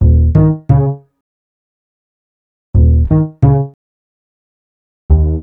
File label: Happy Upright, Upright Bass